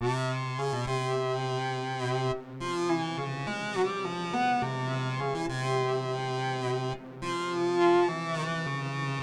Synth B, 104 Cmin; Hip Hop

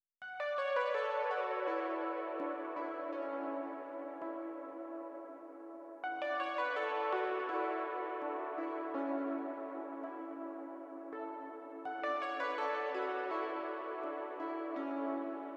165 BPM B Min Pluck; Cymatics; Destiny Gold Expansion Stems; For The Night

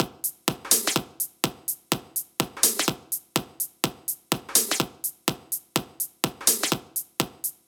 Top loop, EDM 125 bpm; Future House; Drop; Ableton Projects; Big EDM 125 bpm (Drop) Project

